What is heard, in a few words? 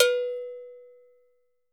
Cowbell - B; Percussion; Cymatics - Infinity Beta Pack 2.0; Zeus Percussion Collection